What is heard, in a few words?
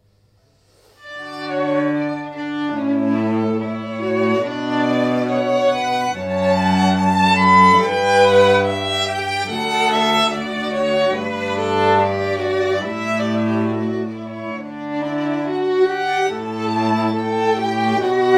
ensemble